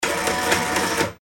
tram ticket canceler

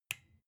desk lamp off soft

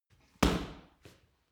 punching bag kick hard

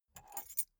key ring small grab table soft

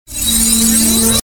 reverse synthetic flanged

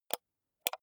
computer mouse wheel click slow

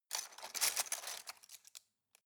cutlery plastic multiple drawer grab soft

